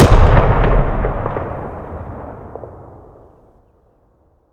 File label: Explosions Explode Distant; DCS